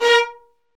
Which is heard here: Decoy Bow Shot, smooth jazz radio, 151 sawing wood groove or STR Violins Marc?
STR Violins Marc